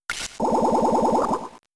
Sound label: Mega Drain; Pokemon; Gen 3; attack moves; RSE